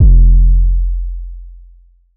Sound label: bass 808 bwom F; Polyend; ARC Noise